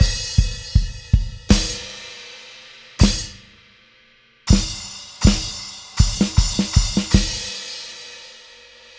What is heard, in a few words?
Perc; Music GnomeGnight EpicSpawn